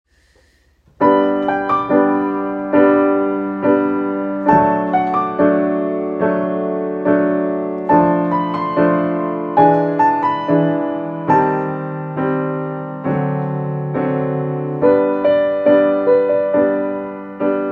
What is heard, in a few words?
Steinway, piano; Modèle B (2m11) Année 1896; Meuble en Palissandre de Rio; STEINWAY & SONS